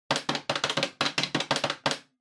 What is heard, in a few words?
Public Phone Button Pattern Strong